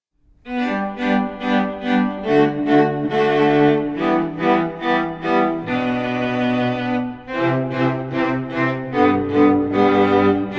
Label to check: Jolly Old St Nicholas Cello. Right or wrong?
Right